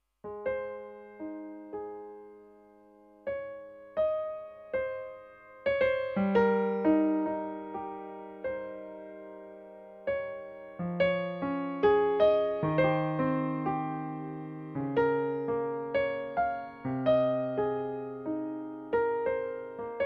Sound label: Piano